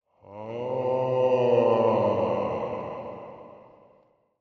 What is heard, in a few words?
death